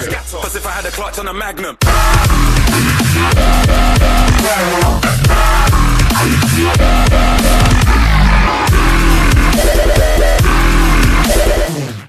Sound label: electro; roundsound